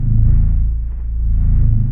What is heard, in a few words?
Hum Lo Pulse Loop